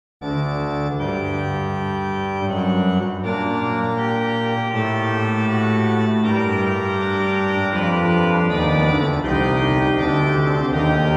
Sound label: Messe Paroisses Deo Gratias, OrganMusic; Couperin Francois; Souvigny